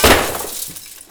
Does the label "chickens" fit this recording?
No